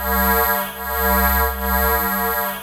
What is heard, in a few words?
RM DRONE, RMOD DRONE; Zero-G Ambient 1